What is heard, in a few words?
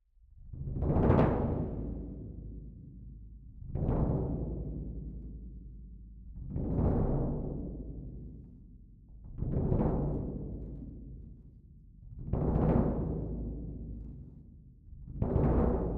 Cassa Close